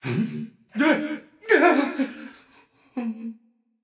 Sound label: fear, voicepack; smite; jorm